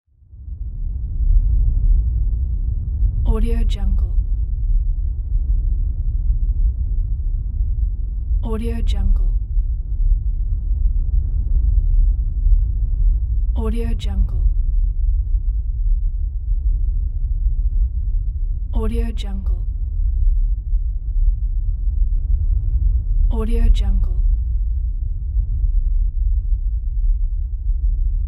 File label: low bass rumble drone